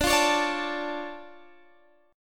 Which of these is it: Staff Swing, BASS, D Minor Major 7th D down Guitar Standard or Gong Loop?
D Minor Major 7th D down Guitar Standard